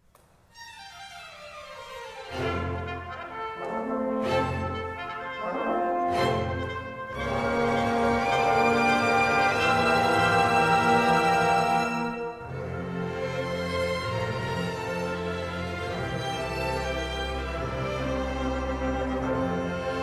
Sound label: Fanfare